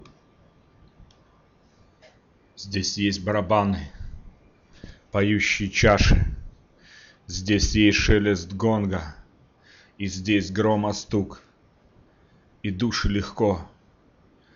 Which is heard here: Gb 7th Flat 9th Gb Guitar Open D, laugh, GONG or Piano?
GONG